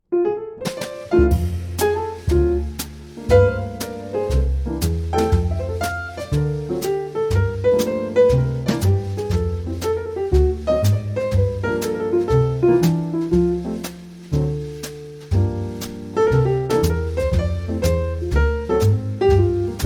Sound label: backing track